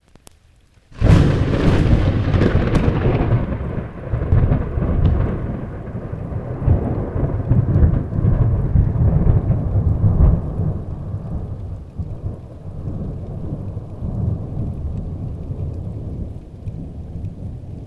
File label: Thunder Crack Pretty Close Bit Of Distortion Urban; Toronto 1am